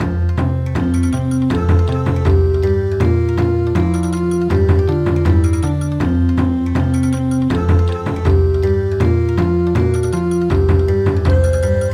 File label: Bon Odori